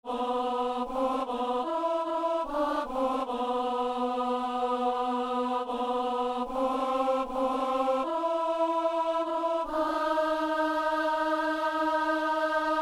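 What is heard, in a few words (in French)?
ténor; Par Jésus-Christ ressuscité (P.U.)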